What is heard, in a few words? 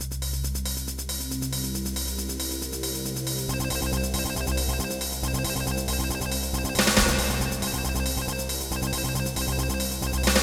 theme; spectre